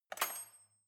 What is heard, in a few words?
cutlery knife drawer handle rattle